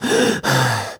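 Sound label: Realistic Fatigue Voice, male; oblivion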